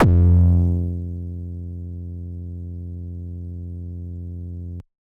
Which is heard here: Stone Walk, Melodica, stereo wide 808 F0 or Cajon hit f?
stereo wide 808 F0